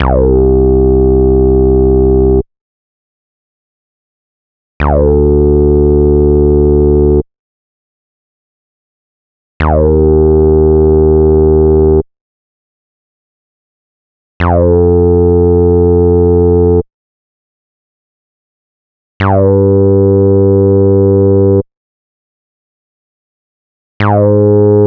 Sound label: Acid Bass Cm Minor